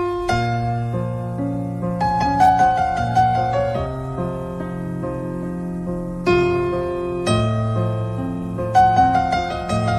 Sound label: Piano